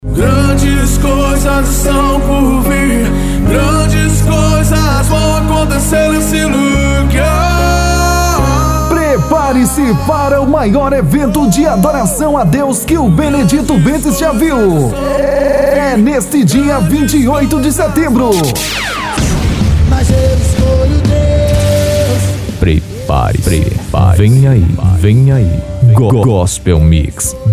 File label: gospel mix